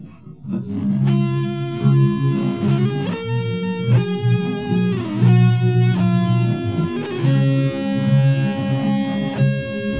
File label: nodrums inst sim; SASSEC07